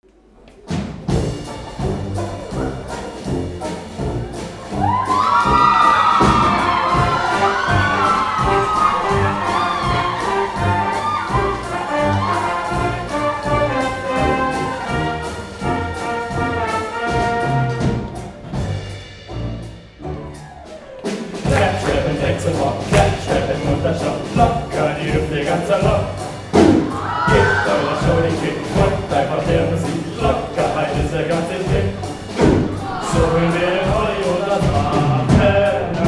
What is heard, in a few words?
clap